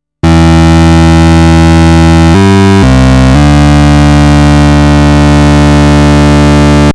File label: Moog over